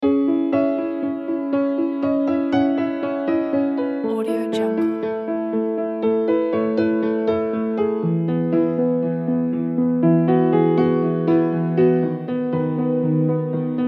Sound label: pianissimo